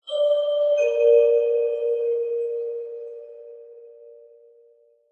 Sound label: MBTA Chime